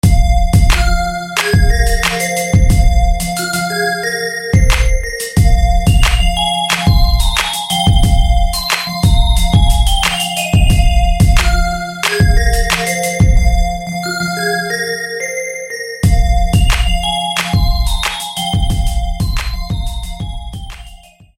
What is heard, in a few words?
Crystallized Keys